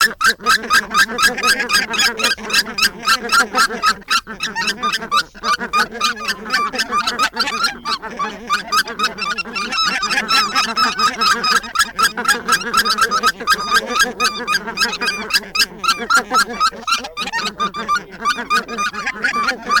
Гуменник Oca Granaiola; plurifon

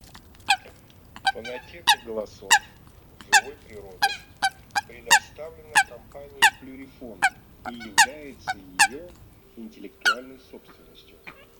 Лысуха Folaga; plurifon

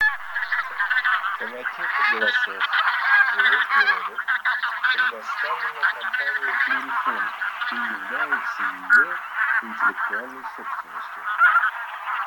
Гуменник базар Oche Granaiolle; plurifon

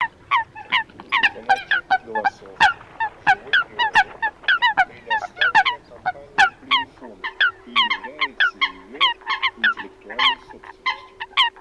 Лысуха Folaga; plurifon

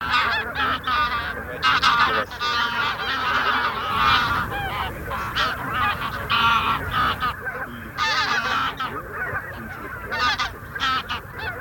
Гусь серый базар Oche Selvatiche; chip mini 234 plurifon

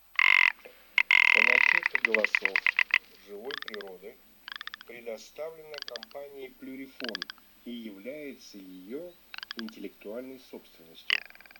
Куропатка тундряная Pernice Blanca

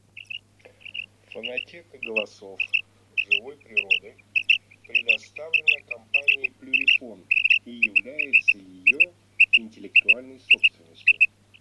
Перепел Quaglia; plurifon

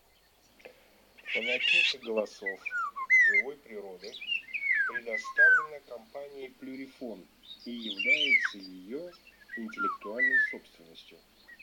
Иволга Rigogolo

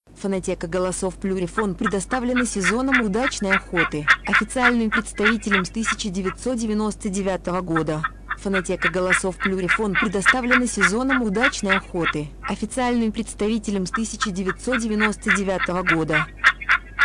глухарь; plurifon

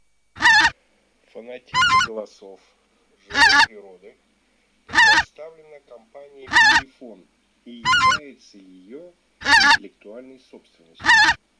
Белолобый Oca Lombardella; plurifon

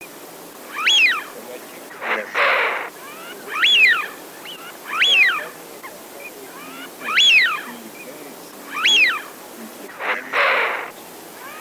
Свиязь Fischione; chip mini 234 plurifon

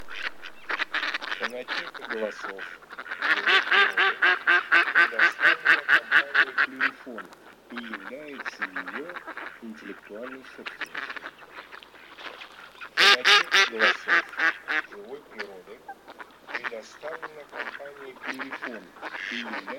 Кряква Germano; plurifon

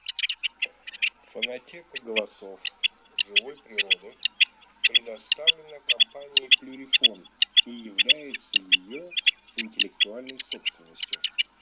Куропатка каменная Coturnice

